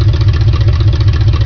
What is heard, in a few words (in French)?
MOTEUR; MEGARACE